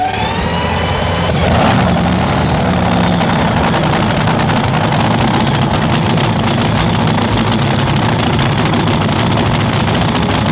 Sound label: NHRA Top Fuel Idle, Top Fuel; Top Fuel Startup & Idle